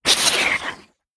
biter death; factorio; Factorio 0.14.21; creatures

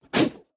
spitter; factorio; Factorio 0.14.21; creatures

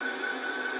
express transport belt; factorio; Factorio 0.14.21

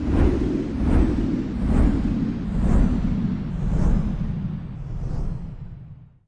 fan off; CounterStrike; cstrike

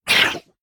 spitter death; factorio; Factorio 0.14.21; creatures

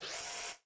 inserter basic; factorio; Factorio 0.14.21

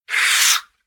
biter roar short; factorio; Factorio 0.14.21; creatures

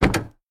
car door open; factorio; Factorio 0.14.21

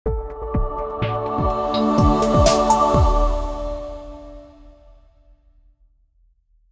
game won; factorio; Factorio 0.14.18

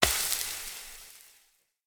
projectile acid burn long; factorio; Factorio 0.14.21; creatures

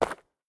dirt, walking; factorio; Factorio 0.14.21

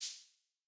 crafting finished; factorio; Factorio 0.14.19

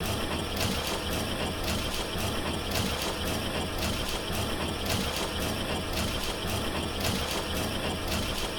assembling machine; factorio; Factorio 0.14.21

